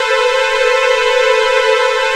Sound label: scary C3; Best Service Dream Experience